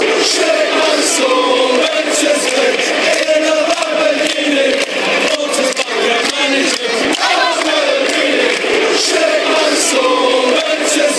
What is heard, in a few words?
manuel pellegrini chant